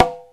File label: CONGA SET; OMI - Universe of Sounds